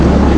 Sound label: REFUEL; ast miner